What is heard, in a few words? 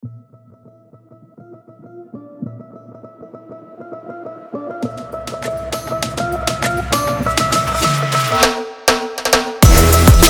music